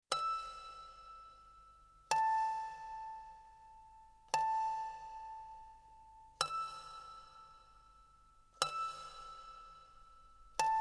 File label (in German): Abendglocken